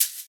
Clear Shaker C Key, Royality free shaker tuned to the C note. Loudest frequency: 9417Hz; percussion